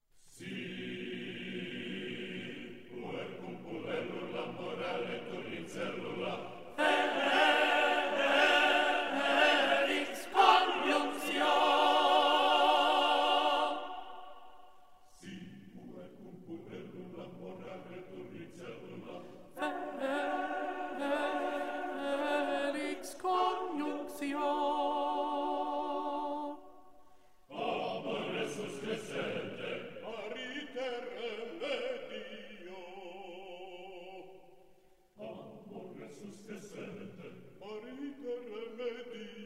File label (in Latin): Si puer cum puellaula Tenor slow